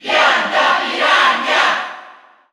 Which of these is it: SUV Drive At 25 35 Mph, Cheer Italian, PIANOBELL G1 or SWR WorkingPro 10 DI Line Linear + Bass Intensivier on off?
Cheer Italian